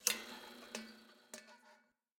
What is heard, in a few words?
Lamp Long On; Game rip; MiSide